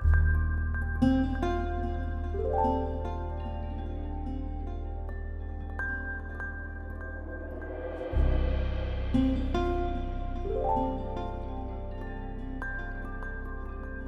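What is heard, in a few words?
lowkey loop 148bpm G Minor; chicory a colorful tale; ps4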